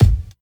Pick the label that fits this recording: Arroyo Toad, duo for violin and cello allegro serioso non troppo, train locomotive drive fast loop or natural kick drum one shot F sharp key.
natural kick drum one shot F sharp key